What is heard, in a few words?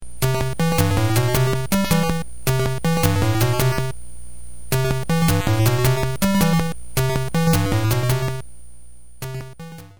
pipes; Super Mario Land; Underground/Pipes